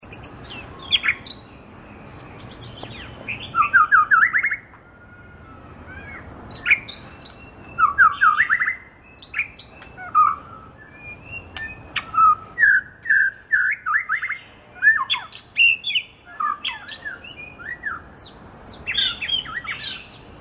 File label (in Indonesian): suara murai medan